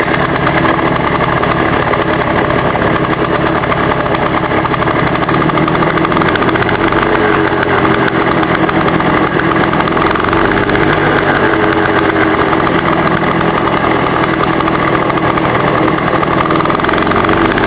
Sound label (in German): Standgeraeusch